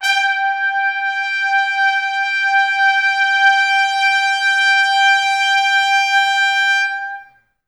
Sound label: G4, Pop Brass; Best Service; ProSamples vol.19; AKAI